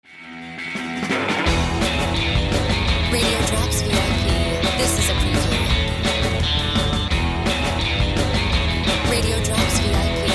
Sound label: Music Bed